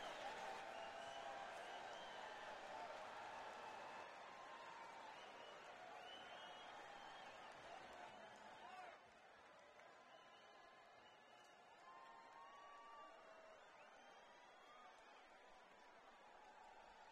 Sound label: Crowd; Miami, FL - American Airlines Arena